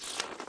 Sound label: page; BG2; Msstyles; Styles visuels